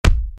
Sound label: Gorilla Chest Hit; creatures organic